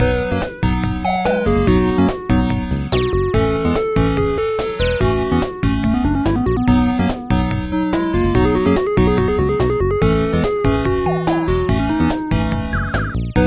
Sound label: Continue Loop